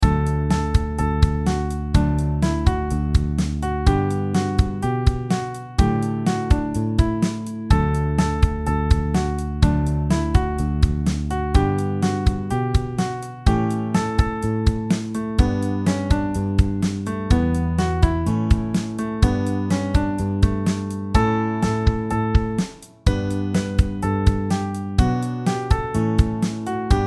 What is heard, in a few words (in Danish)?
D dur